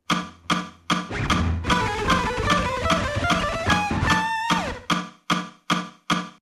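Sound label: alternate picking fast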